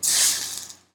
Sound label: sizzle